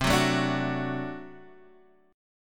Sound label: D 7th, C